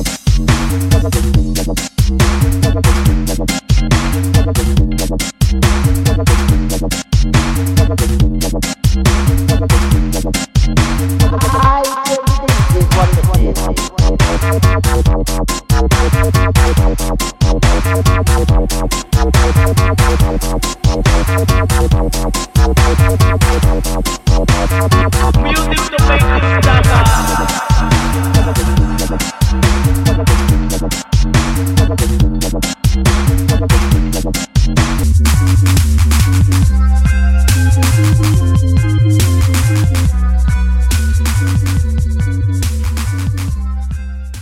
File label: Dubstep Samples